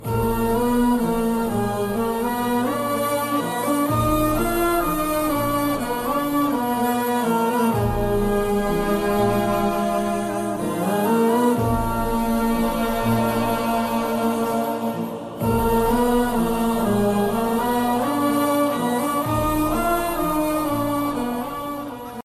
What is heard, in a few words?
Emotional BGM, Malayalam; Madhuraraja